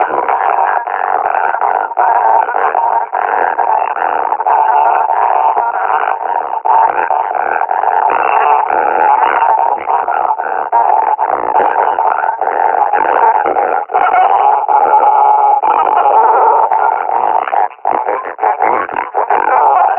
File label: breathing, combine officer; Half Life Alyx; Half Life Model Pack